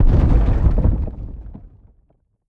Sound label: farmhouse shake thump